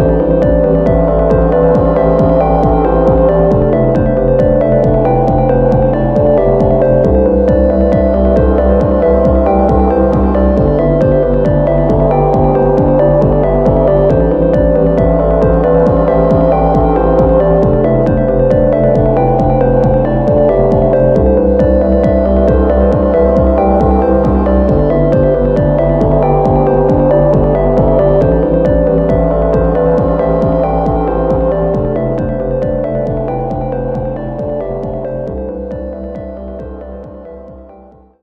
BGM result; Afterlost Shoumetsu Toshi; athena

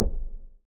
Knocking Single Calm

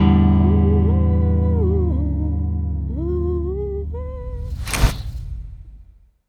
Cutscene Outro Sfx; Gary

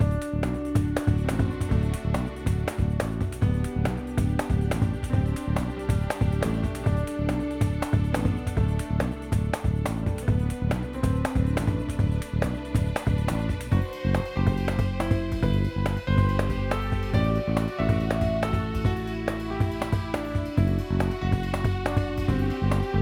music bosque; Lootlite; Xbox Series X/S

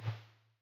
Flowers Close; Forest SFX

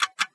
Clock Bonus; Farm Mania

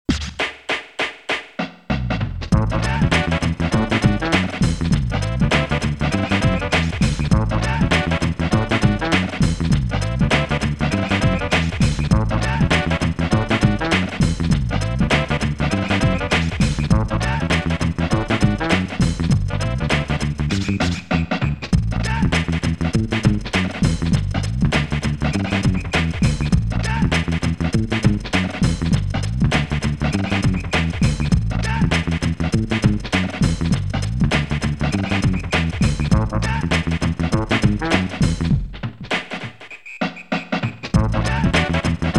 Hop Step and Jazzy Beats; Takahashimeijin no Daiboukenjima

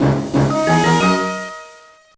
Call Animal; Enchanted Once Upon Andalasia; GBA

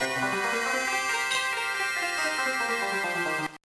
Microgame; Willow Frog; Wario Ware Twisted; Gameboy Advance

